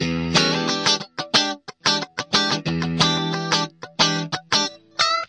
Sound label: funky rhythm wah gtr; GUITAR LOOPS